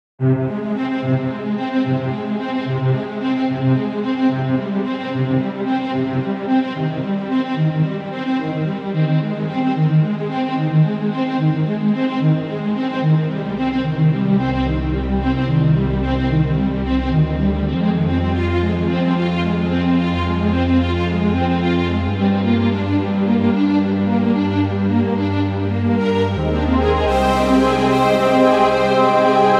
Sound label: scoring synths omnisphere